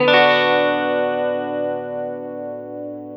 GIT, Drum & Bass, 150 BPM; Ueberschall; Drum & Bass Flash Trackz

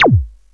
ELECTRO, SPATIAL; East West - Global Sounds

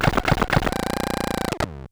Bent Talking Teacher, Circuit Bent

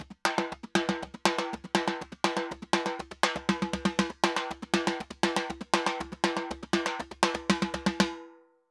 Timba Samba 120 bpm; Best Service; Festa Latina VOL-1

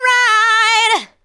ADLIBS

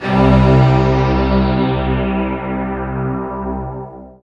SYN K-2000, Roland

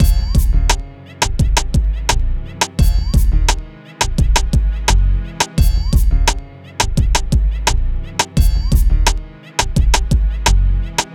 MIX, Hip Hop and R&B Drumloops

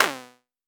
Tempest Analog Snare

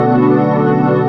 Dream Pad Bm7; Proteus 2000; Digital Sound Factory Proteus 2000

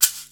ganza, Yamaha Motif ES drum machine; 300 Drum Machines; Yamaha Motif ES-Series

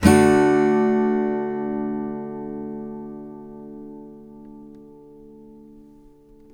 MAJ D, CHDMAJ, Blues Guitar Samples; ILIO; Fretworks

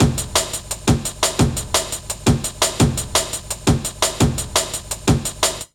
LOOP, Jungle

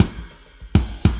Warp Drive Beat, Processed Drumkits; Sonic Foundry (Sony Creative Software); Processed Drumkits Zero Gravity Beats

